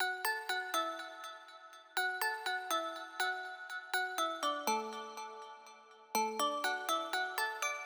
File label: Dmaj 122BPM Lead, Music Loops; 99Sounds; Monosounds; PANDORA BOX!; Coconut Coctail